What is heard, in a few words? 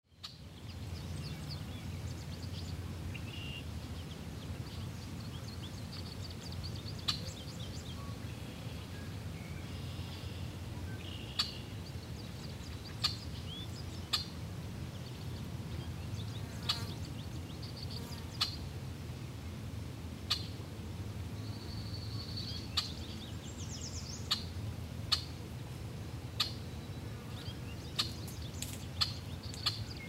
nature